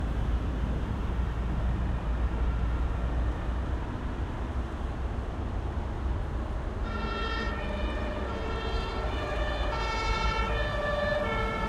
Large Main Road Junction, Cars And Heavy Trucks Passing Slowly, Berlin; Ambulance Siren At Top, Occasional Car Horns; Sonocaine; Quad City Berlin